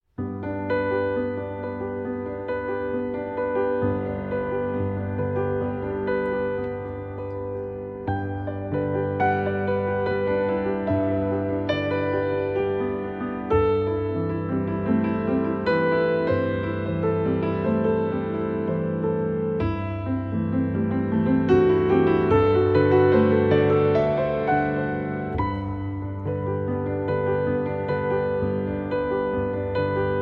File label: The Swan (Arr. for Solo Piano)